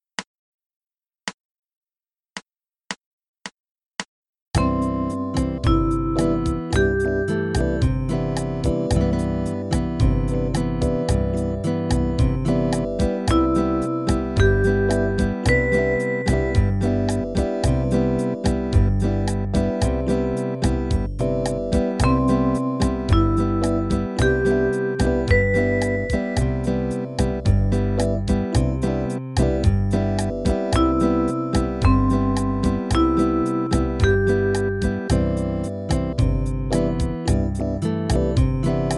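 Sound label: Maj7 Root, arpeggio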